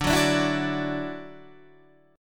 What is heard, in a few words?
D-Major Add 9th D down Guitar DADGAD